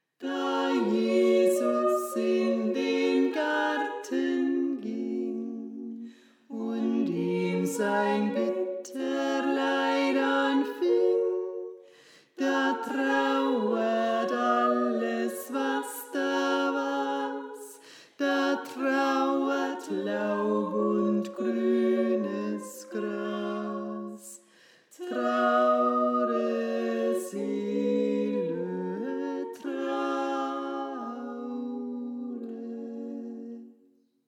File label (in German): Tenor; Da Jesus In Den Garten Ging